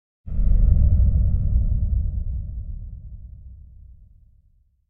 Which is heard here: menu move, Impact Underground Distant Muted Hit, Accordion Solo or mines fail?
Impact Underground Distant Muted Hit